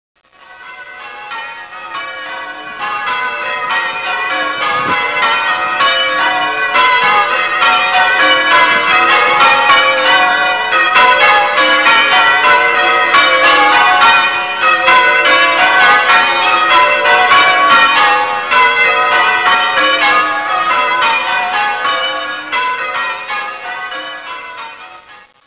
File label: bells, surprise minor; St Giles Wells